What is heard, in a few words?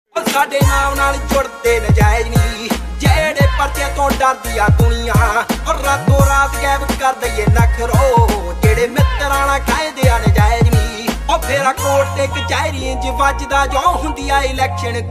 Punjabi Ringtone